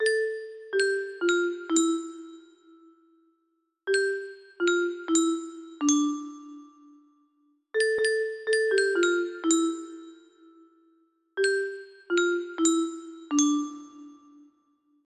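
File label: music box melody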